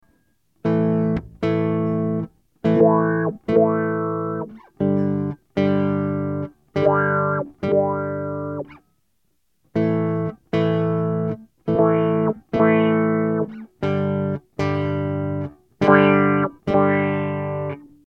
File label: Morley PBA2 Basswah